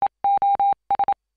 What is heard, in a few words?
message